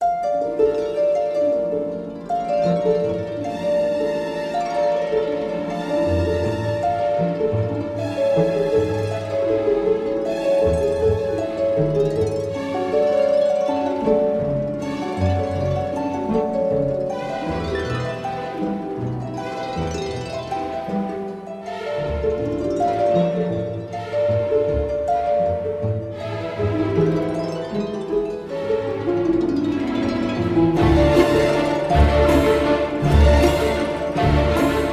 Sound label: Halloween night music